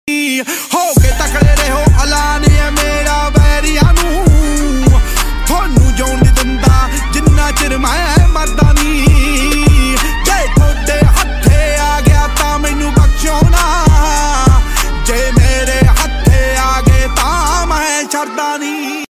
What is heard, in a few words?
punjabi ringtone; new song